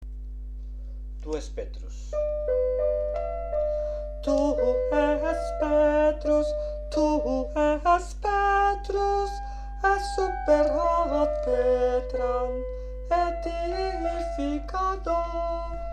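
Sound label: tu es petrus soprano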